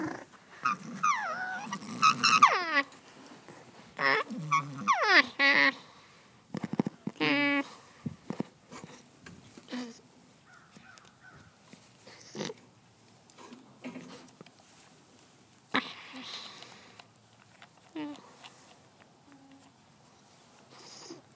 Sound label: Consternation, alpacas